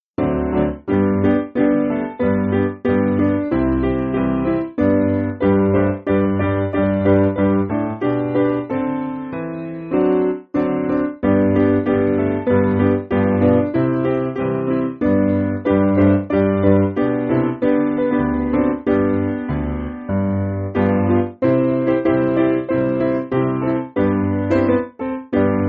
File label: Piano